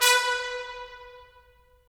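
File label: brass trumpets staccato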